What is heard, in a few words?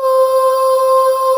VOICES C5, Choir; USB Soundscan vol.28 - Choir Acoustic & Synth; AKAI